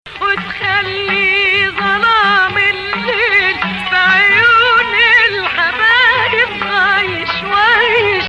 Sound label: Rast Hijaz